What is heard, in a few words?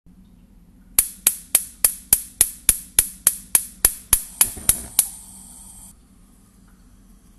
kitchen